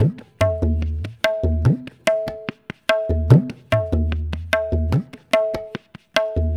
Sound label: Tabla